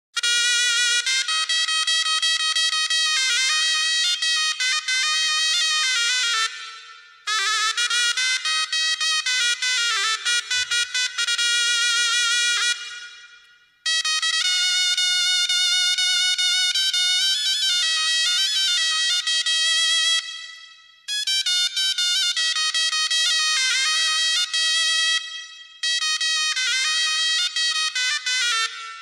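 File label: Moroccan clarinet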